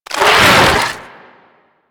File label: Moa Satyr Legs Roll; Warframe; Amalgam; Abilities